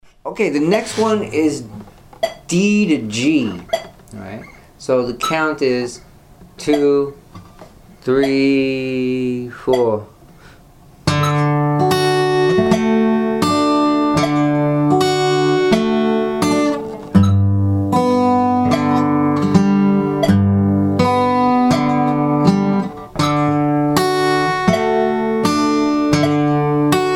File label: travis picking outer inner D to G at 40 bpm